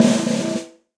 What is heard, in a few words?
Snare Press Big Punchy; Snare Articulation; Drum Hits; Session Drums Club; Standard Hits; Ableton